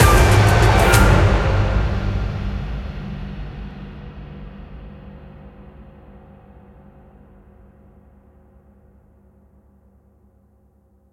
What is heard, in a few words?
big Win End; templeofnudges